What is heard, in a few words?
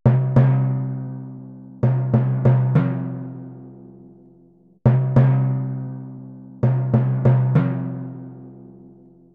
timpani, Cmin; PowerFX - Downtown Orchestra; Orchestral arrangements